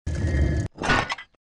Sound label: wall trap